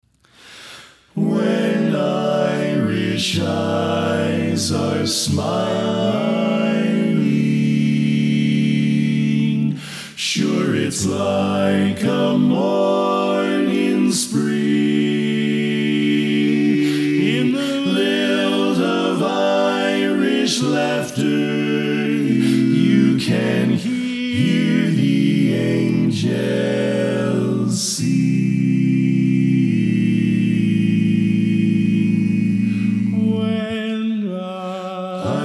Bass Left

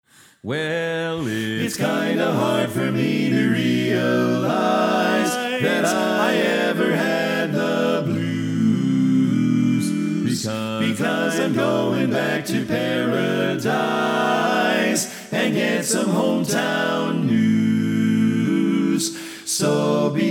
Bari Left

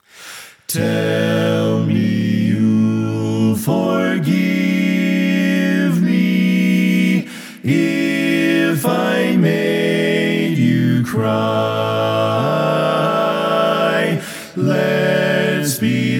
Equal Mix, quartet

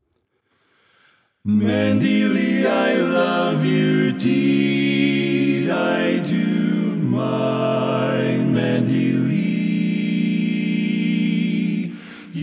Bari Left

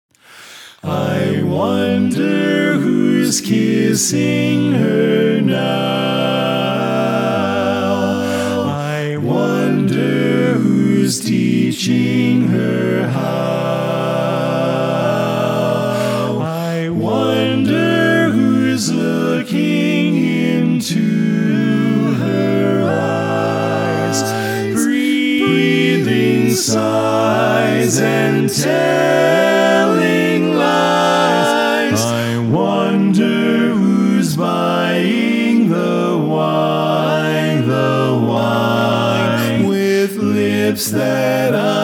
Lead Left

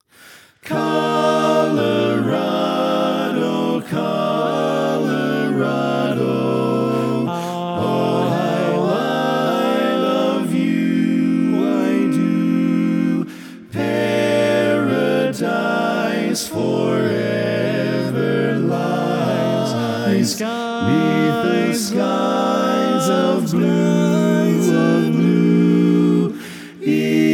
Bass Left; Learning Tracks

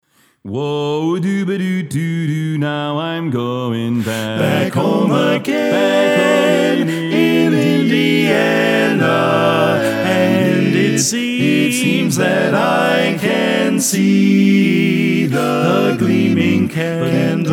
Equal Mix

